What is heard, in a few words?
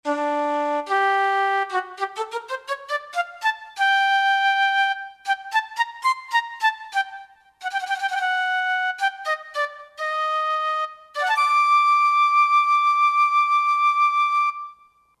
Intimate Flute, Roland JD-990; Factory Patches